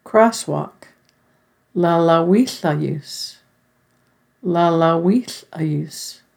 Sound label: Crosswalk